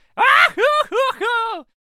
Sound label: Lasso Attack Vocals